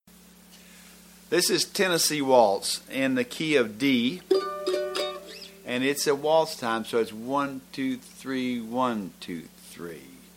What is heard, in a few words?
(D)